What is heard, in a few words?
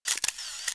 Shutter; KE770 Shine